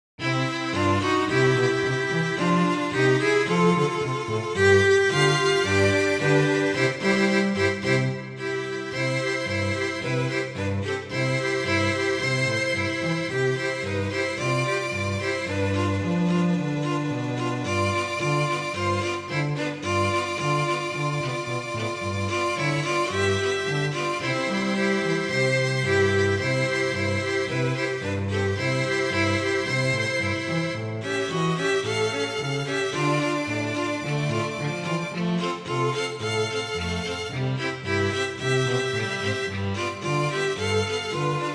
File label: Polka